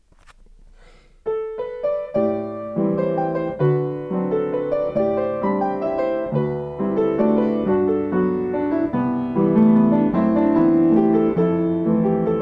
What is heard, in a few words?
Weeping walz